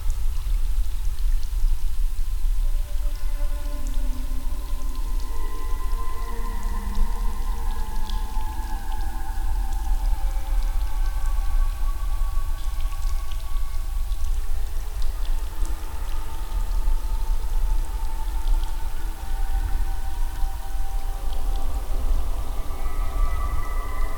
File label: cave; Golden Crayon Sounds